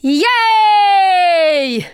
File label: Emote Cheer; Twitch Skijump; Tia